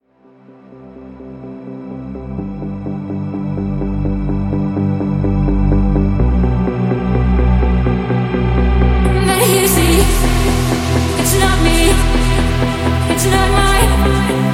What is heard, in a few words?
Tech House Redrum; Remixfreakz